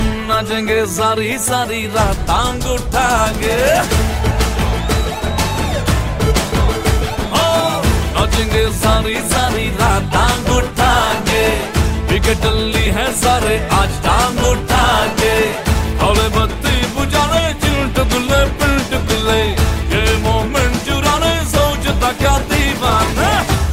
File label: New Dance Ringtone